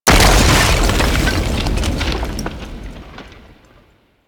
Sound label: Mountain Pass Sculpture Ice Explosion; Ambience; Oro; Warframe; The War Within